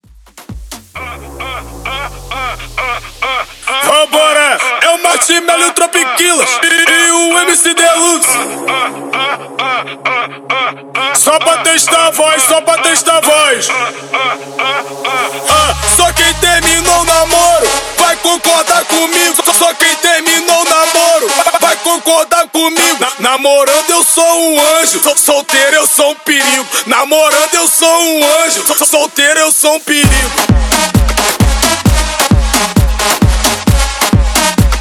Upbeat Latin Afro Re Drum 132 BPM

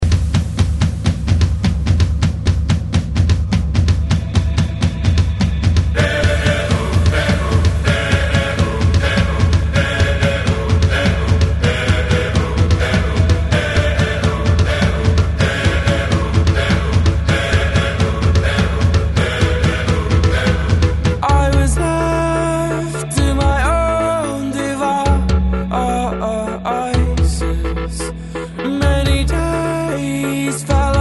Rock Extended

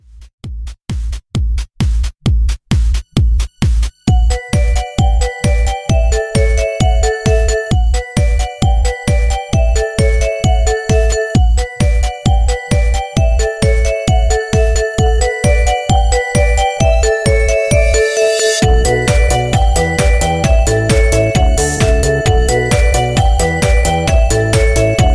Throwback Redrum 132 Bpm; djyan

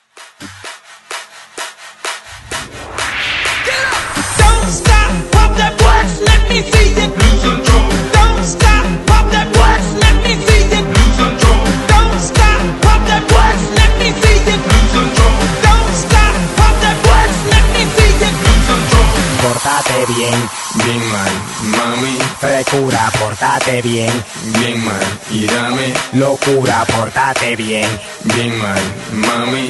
Remix Hype 128Bpm; DJDOLLS

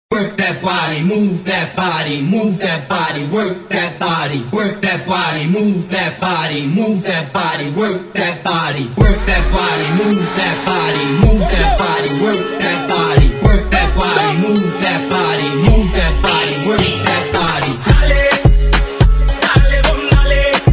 Acap intro

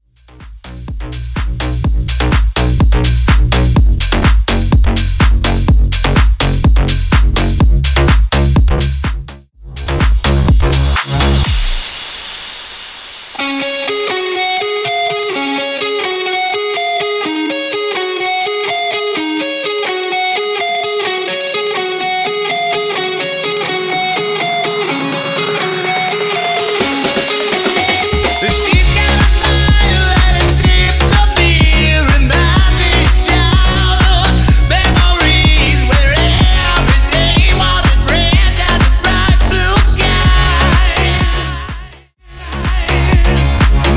rock, 125 bpm; Rock Remix

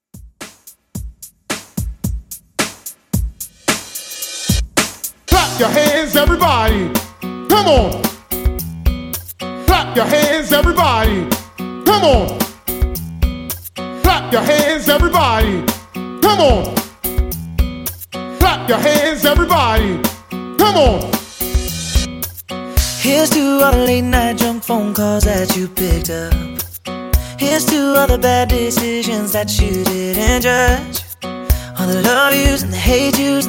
Top40 Hype Starter 110Bpm

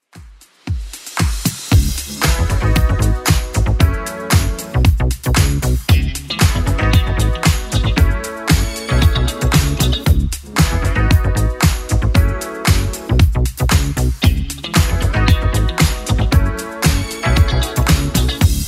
80s Club Edit 115bpm

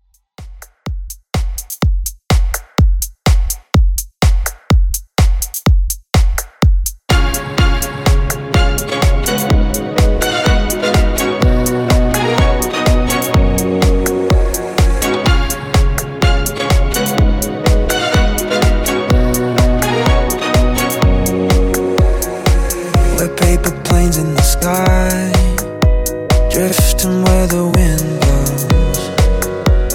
Club 125Bpm

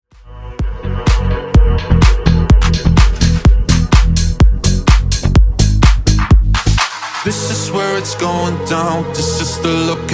Funk 126bpm

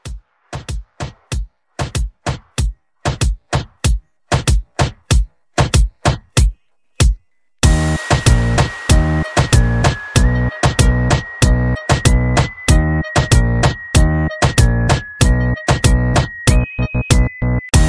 Latin Club, 95

